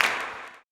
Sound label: CLAP, blues & soul; ILIO; Vocal Planet